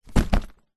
foley luggage suitcase set down carpet; zapsplat

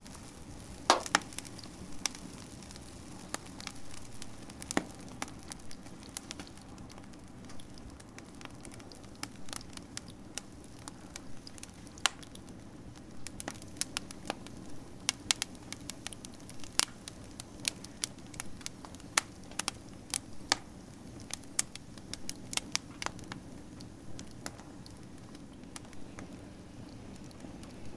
nature fire garden incinerator fire

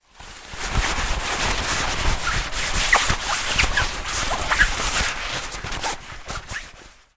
life jacket movements putting on or taking off; zapsplat

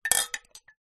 household plastic wine glasses bang together

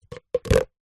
sport cone plastic stack others

